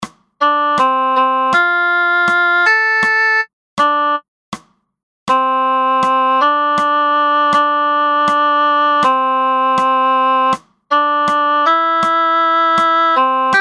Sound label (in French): Musique algorithmique do majeur